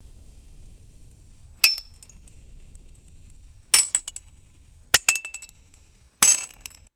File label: gentle metallic clinks against rock